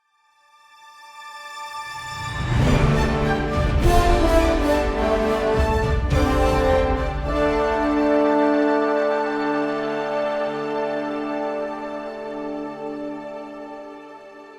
FTL Jump Melodies; Helldivers 2

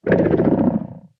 stomper idle big, enemies; Factorio

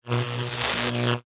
electric, electromagnetic plant; Factorio

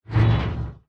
recycler mechanic; Factorio

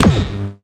railgun gunshot; Factorio

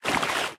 wriggler walk; enemies; Factorio

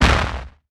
asteroid damage large; Factorio

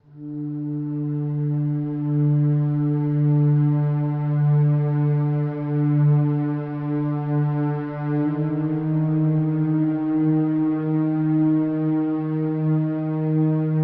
ambient, vulcanus; Factorio - Space Age; Vulcanus interlude 1

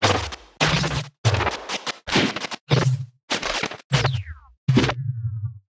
ash cloud disruption; factorio

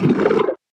stomper death, enemies; Factorio

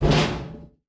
recycler jaw shut; Factorio

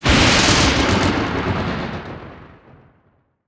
lightning effect; Factorio

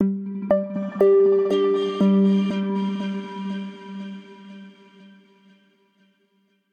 ambient, gleba; Factorio - Space Age

